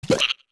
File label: Hop; Gekko hopping (4 pitches)